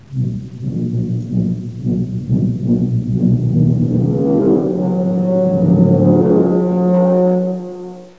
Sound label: groan; ambience; caged